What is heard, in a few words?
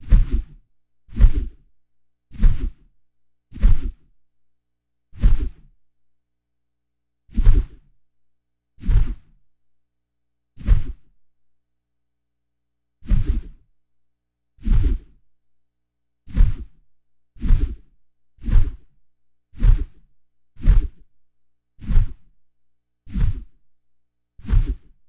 Heli Blades Whooshes